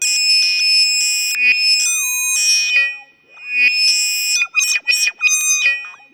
Odd Modular; radiosonics

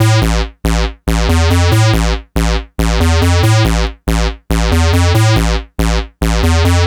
NRG Lead, trance; Sonic Foundry (Sony Creative Software); Trance NRG VOL-1

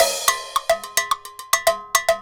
TMBAL B DE

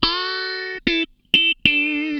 FAZE PLUK, Funk Guitar; 90 s Sample CDs; Zero G

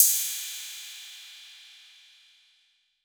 PERC 808

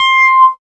Dance Synths, Acid Bubble, c

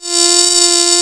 ELECTIC FLY

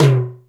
FX TOM HI; Back In Time Records; Big Bang

